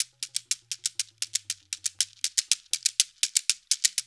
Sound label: 120 JU, African Contemporary; Spectrasonics; Supreme Beats; Ju-Ju